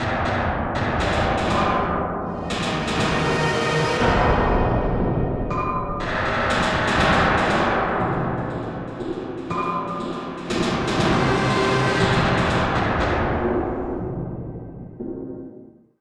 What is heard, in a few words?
Danger Tonal; Insanely Twisted Shadow Planet